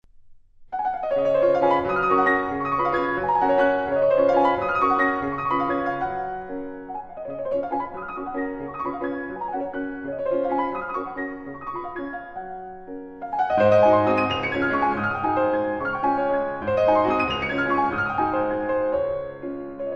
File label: Valse In G Flat Major; Opus 8